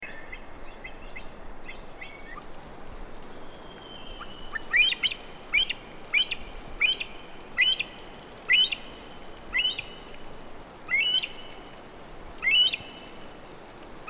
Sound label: Himalayan Monal Lophophorus impejanus